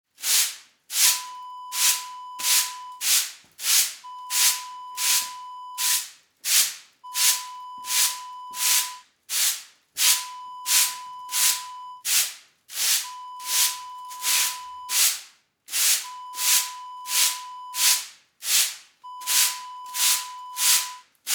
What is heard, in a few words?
broom sweep